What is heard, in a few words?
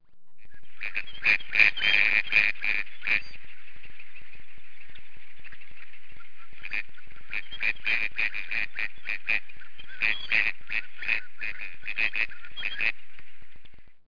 GADWALL